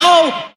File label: "oh"; Voice